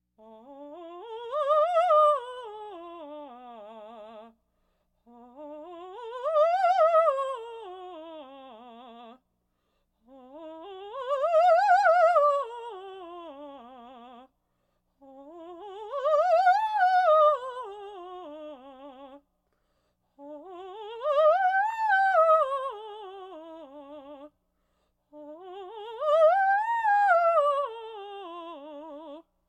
Laugh tracks

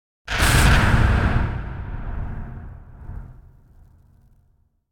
Impact Airy Whoosh Medium; Hollywood Edge; sound designer's toolkit v2

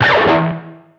Pickup Guitar Scrape; SFX Kit; Pickups

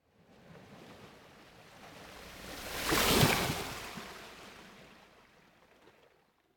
Ocean Gentle Water Lap On The Coast Surf; Hollywood Edge; sound designer's toolkit v2

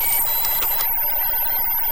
Scifi Duplicator Access Loop; SFX Kit; Sci-Fi Loops

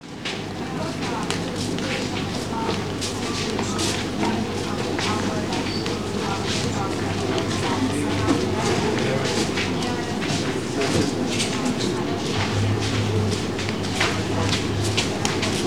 hotel hall, surroundings; best service Blue Box

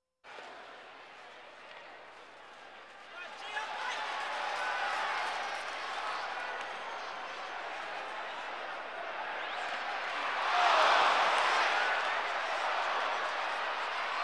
Sports crowds

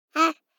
BABIES TALK Boy 12 months Ha; Baby Boom

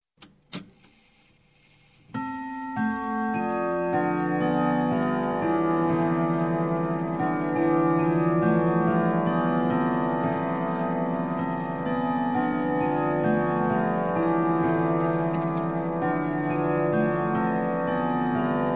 Clock, Grandfather Whittington Chime - Strike; Strike Ten O'clock; Sound Ideas 2000 Series

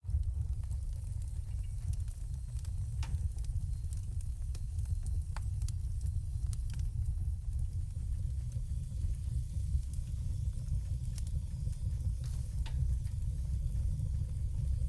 Mountain Pass Wooden House

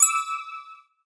Pickup Gem Bells; Pickups

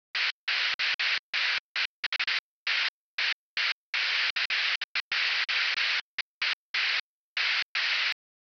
Radio Static Sci-Fi Cut Off Radio Static; Hollywood Edge; sound designer's toolkit v2

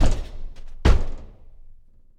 Boxing Ring Bodyfall

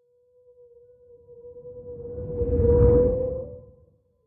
sea animals; Blind Drive

